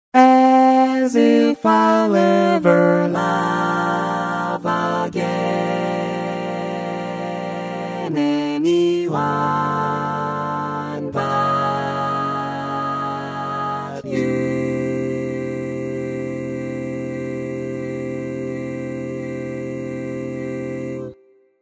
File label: Bari, barbershop tags